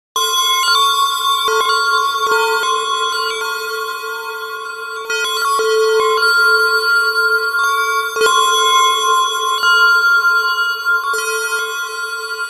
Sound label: dingondingdongon